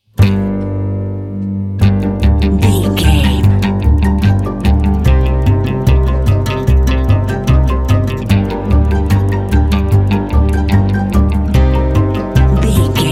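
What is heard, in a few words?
polka crazy fun hour no drums alt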